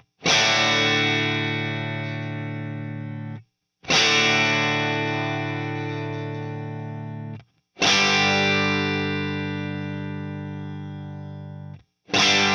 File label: Tweed DLX SM57 NEVE 1081 8ohm